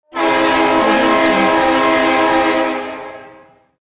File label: train horn